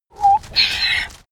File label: donkey bray close